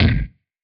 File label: amp kick